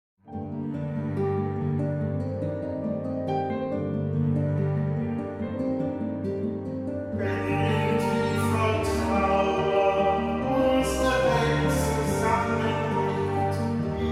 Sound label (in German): Gesang; Lied zur Jahreslosung 2016